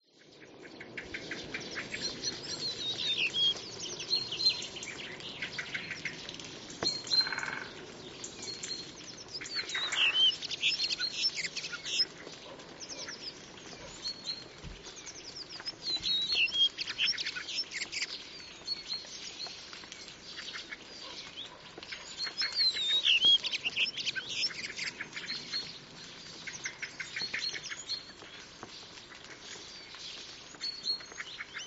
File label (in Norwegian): stær